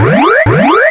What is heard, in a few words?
Remote Alert